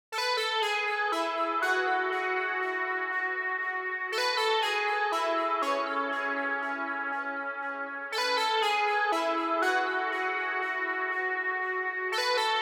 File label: 120 BPM F# Min Lead; Melody Loops; Escalade; Retro Collection; Cymatics; ANALOG EVOLUTION Beta